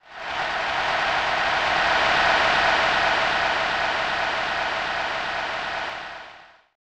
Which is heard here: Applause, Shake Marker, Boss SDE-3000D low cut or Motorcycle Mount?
Applause